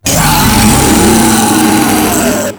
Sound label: Proto Infested Ancient Taunt Scream; Warframe; Abilities